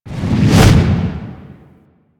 Blind Cast Charge Up; Warframe; Gadgets

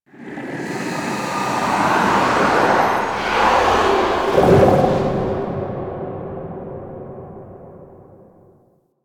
Queens Lair SFX Operator Scepter Whooshes; Warframe; The War Within

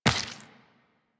Step Flesh, Footsteps, Walk; Warframe; Tenno

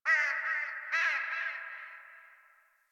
Crow; Warframe; Clan Dojo; Dojo Misc; Dagath

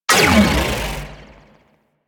Mech B, Charge Gun; Cycron

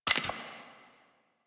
Weapon Timer Complete; Warframe; Caliber Chicks minigame; pick ups

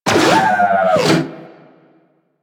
Research Facil Repair Robot Rotate; Warframe; Ambience; Venus Open World; Objective Rooms; Props One Offs

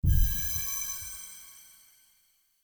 Shrine Puzzle Correct Unlock; Doors; Puzzles

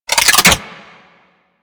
Archwing Mach Gun Equip Short, Weapons; Warframe

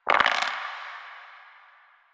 Dice Roll One; Warframe; Koumei

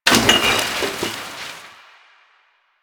Garbage Bag Destroy; Warframe; Ambience; Nineteen Ninety Nine

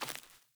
Sandals Run Vegetation, Footsteps

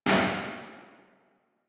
Tail Generic Interior Medium, Weapons

